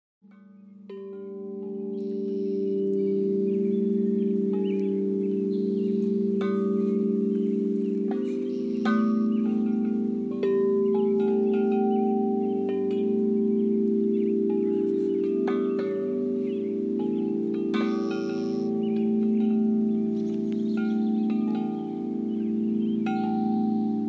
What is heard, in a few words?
chimes